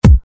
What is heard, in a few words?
kick